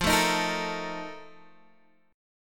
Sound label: F 11th F, down, Guitar DADGAD